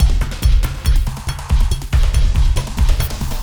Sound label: Wild Ride 140